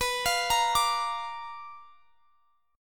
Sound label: B Minor Major 7th Flat 5th, chord; BmM7b5 arpeggiated